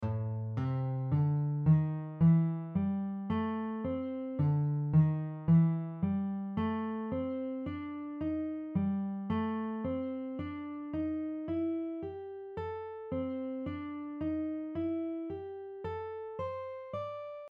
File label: 8 note ascending blues scale pattern, guitar; starting from the lowest string and then starting the consecutive pattern again on the next string